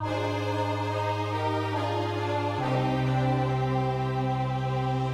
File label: Game Over; Aladdin; Windows 95; Roland SC-55